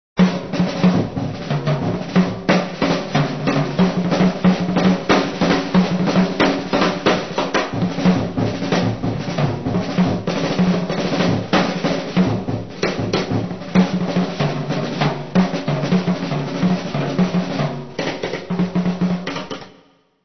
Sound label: fills jazz